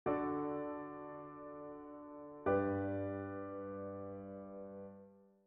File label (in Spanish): c. perfecta sol M; cadencias